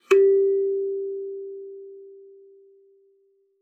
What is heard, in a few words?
KALIMBA G4; Xsample VOL-7